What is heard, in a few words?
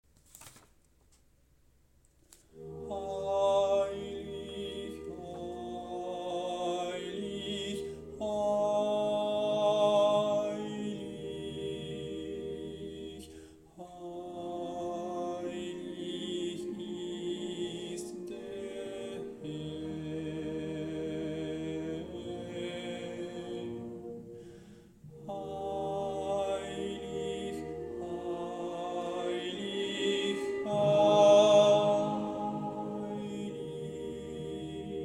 sanctus sopranes